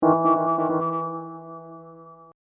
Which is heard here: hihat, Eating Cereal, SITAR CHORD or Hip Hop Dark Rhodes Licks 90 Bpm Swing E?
Hip Hop Dark Rhodes Licks 90 Bpm Swing E